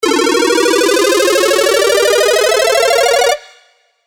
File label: Uplifter; Spire; chiptune